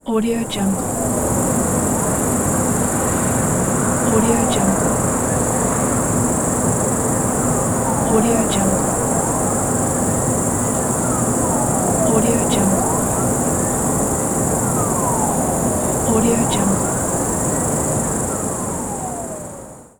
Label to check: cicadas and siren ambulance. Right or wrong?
Right